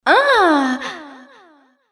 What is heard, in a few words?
moan; Imperial Girls